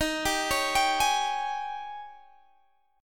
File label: D sharp 11th D sharp, Guitar, Open G